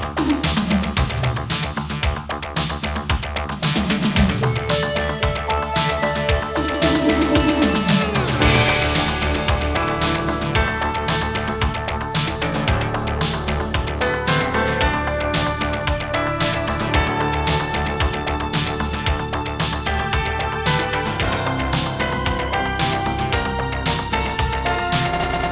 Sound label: Fire Music; VideoVision; Radius